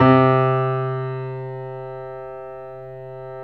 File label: PIA GRS, PIA_GRAND, Roland